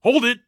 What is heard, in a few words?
stop; Escape from Tarkov